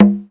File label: 220 lo conga, Korg DDM220 Drums